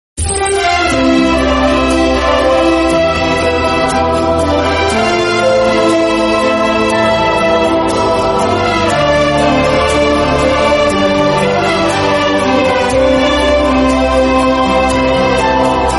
Instrumental Song; Kuch Rang Pyar Ke Aise Bhi

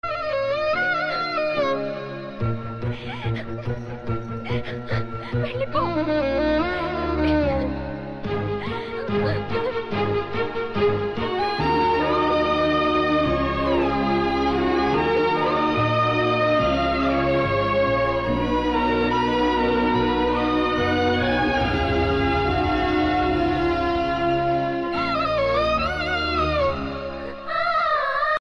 climax bgm; Yamadonga

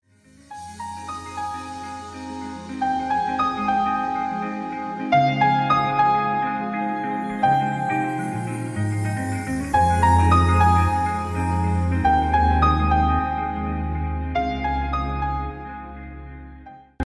bollywood instrumental piano

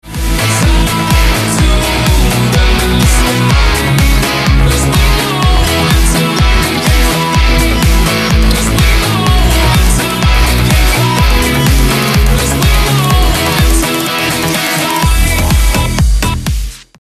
ringtone

